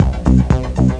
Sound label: bar, Music; Swoop 1.0.2